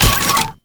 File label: Security Credentials Pickup; Shadowgrapher